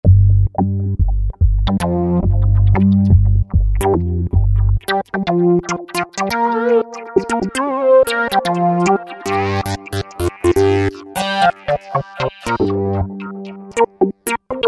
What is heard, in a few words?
Liquid Rubber, Roland V-Synth; Factory Patches